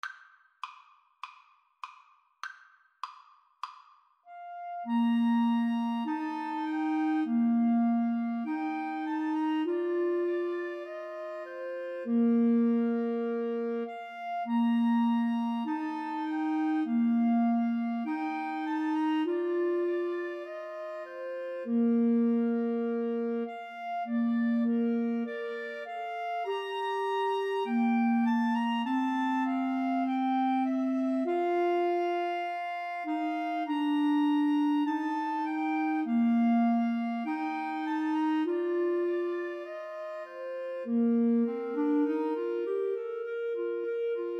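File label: blue bells scotland 3 clarinet karaoke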